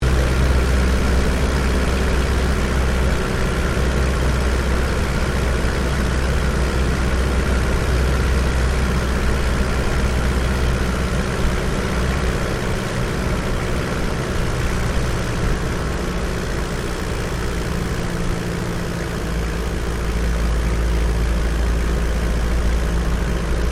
AIRCRAFT DEHAVILLAND TIGERMOTH LANDING INTERIOR LOOP, RAAF Tigermoth biplane; RAAF Tigermoth biplane training aircraft; Airplanes